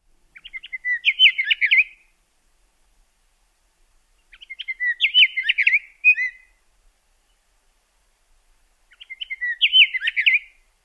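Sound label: song, Scott's Oriole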